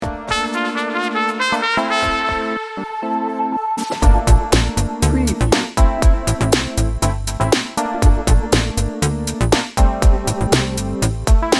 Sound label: smooth jazzy laid back